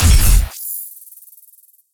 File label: Sci-Fi Weapons-Sniper Rifle Single Shot